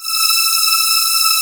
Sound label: AIR VOX E5; AKAI S-Series CD-ROM Sound Library VOL-7